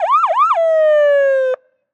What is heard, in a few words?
Fire Truck Siren; Blastwave FX